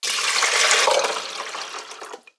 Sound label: Pour Water; Playable Tutorial Level